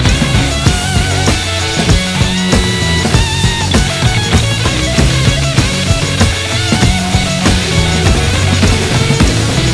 big Win Loop

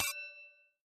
win Countup End; netent; whenpigsfly

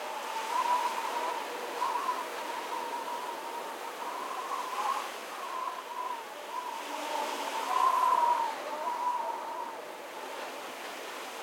wind Gust; netent; narcos

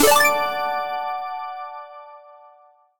big Win End; netent; reelrush

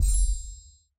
spin Button Click; netent; bloodsuckers2

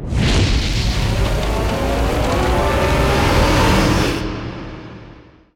near Win; netent

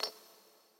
button Down; netent; bloodsuckers2

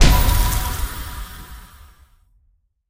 scatter Hit; netent; bloodsuckers2

